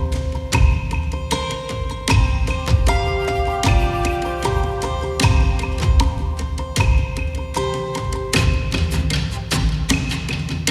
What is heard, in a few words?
Ambient; Eidolon; Cetus